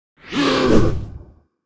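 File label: mob attack; Monster; 원숭이 부족; 금왕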